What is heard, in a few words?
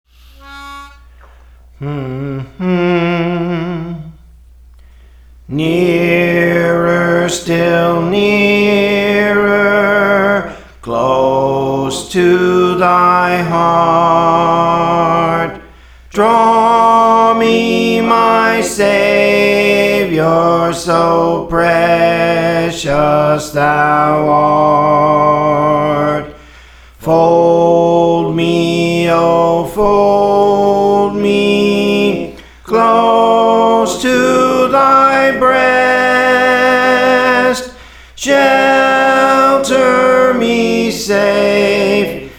Soprano, Songs; Praise For The Lord Training; Nearer Still Nearer